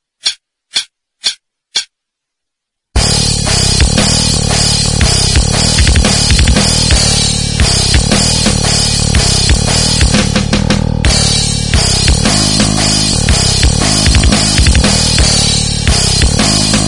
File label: backing